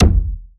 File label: kick stomp; lightning